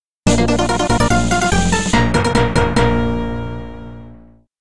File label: Important Item Acquisition Fanfare; Ganbare Goemon Sound Treasure Box